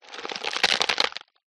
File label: nature ice thick crusty break; zapsplat